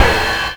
ride, trash kit; Drums Dance & Groove; USB Soundscan vol.11; AKAI

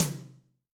Room, Tom, Yamaha DTXpress II

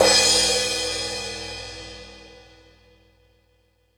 Fusion Stix, Drum Machines

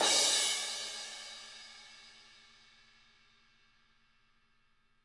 CRSH, CRASHES

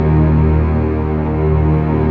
STR Orchestral Strings

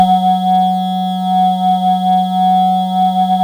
SYN Klack; Best Service Gigapack 1

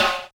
Yamaha DJX 2B, SD Jungle

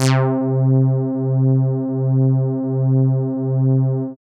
DRY BASS; Masterbits; Dance Trax

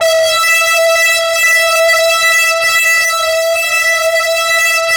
FLYER E4 L, Yamaha VP-1; Music Store; 90's Sample CDs

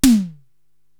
Real E Tom

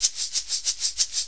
6-8 Shaker, Perc Loops

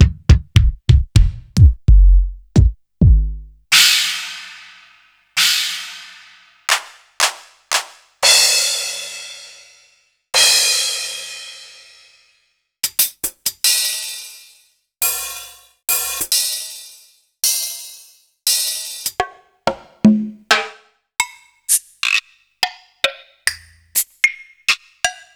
Casio CTK-5000; B-D

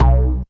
Bendi Bass; Zero-G Jungle Warfare 1, 2 & 3

